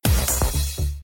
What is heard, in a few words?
symbol car red; Crazy Cars; wazdan